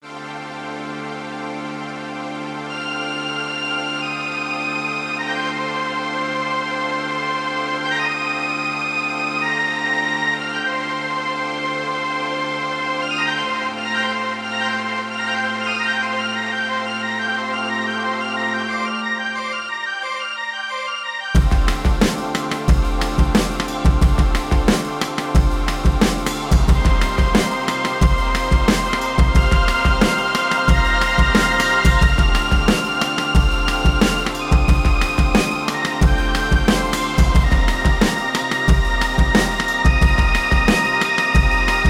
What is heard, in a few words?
Solina; arturia